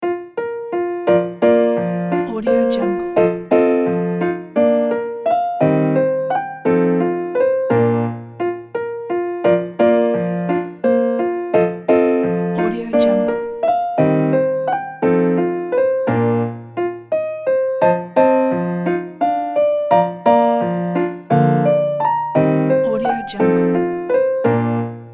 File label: Beethoven German dance